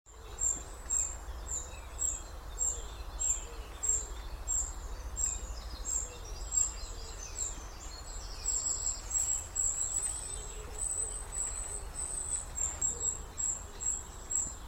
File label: Troglodytes troglodytes; SETE